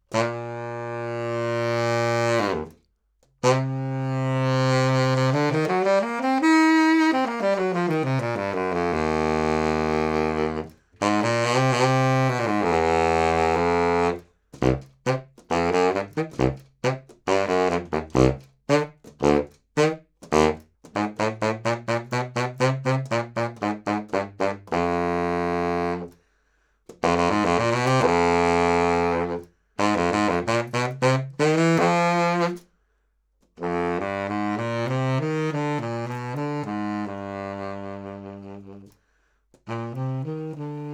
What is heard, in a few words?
Sax Baritone 20cm High